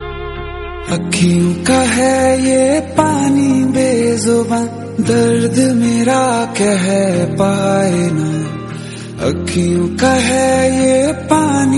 Love Hindi

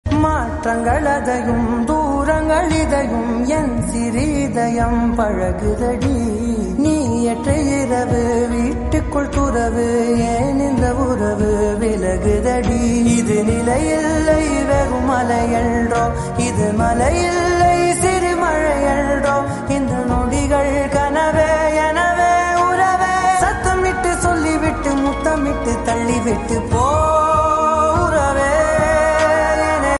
Tamil song

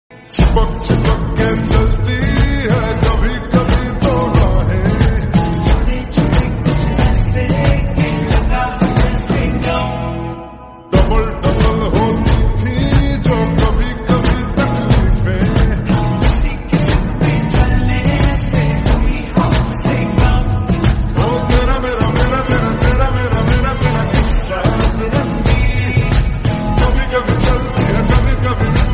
Slowed Reverb Hindi; ringtone

